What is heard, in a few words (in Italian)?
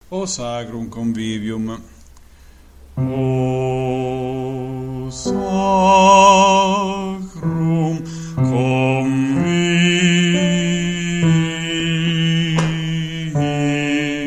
o sacrum convivium bassi